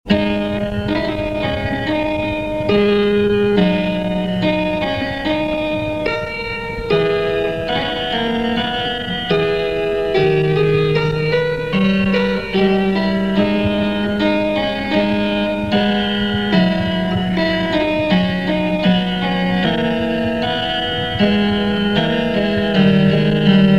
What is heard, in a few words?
Etude For Cembalo